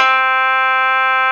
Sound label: Koto C5, Fairlight CMI IIe; Guitars